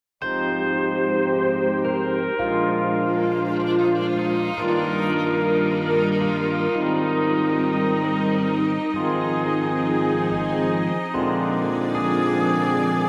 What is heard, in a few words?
Ring